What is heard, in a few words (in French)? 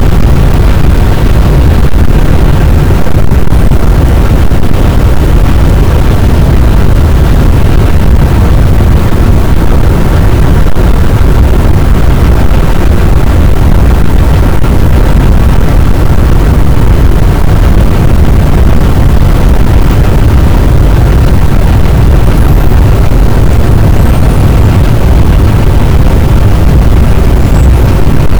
cachalot, boat; Physeter Macrocephalus